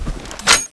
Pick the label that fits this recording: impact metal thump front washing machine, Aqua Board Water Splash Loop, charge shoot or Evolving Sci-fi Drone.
charge shoot